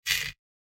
footfall gravel step

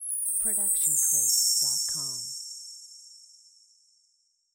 fairy dust

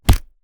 Fight Impact; SoundsCrate

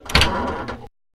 bonnet

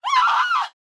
woman injured scream; soundscrate

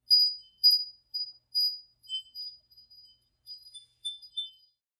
Wind Chimes C; SoundsCrate

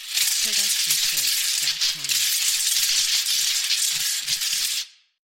sparking lightning; soundscrate

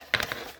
VHS Handling; SoundsCrate

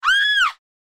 woman fear scream; soundscrate

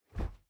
Fighting Woosh; SoundsCrate

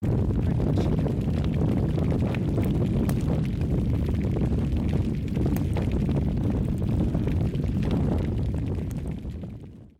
Large Fire; SoundsCrate

